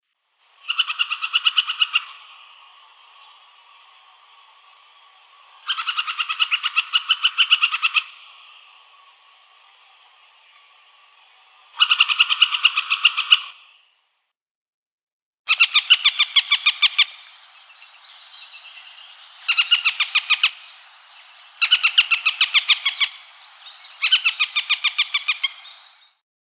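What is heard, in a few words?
hen harrier; Lun polevoj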